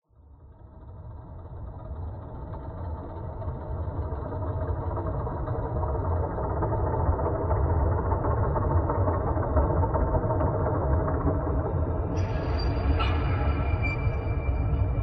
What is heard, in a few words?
Gritting Sample; The Sinking City